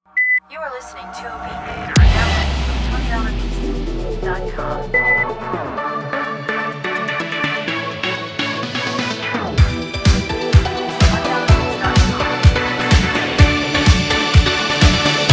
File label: Drive Insane, EDM beats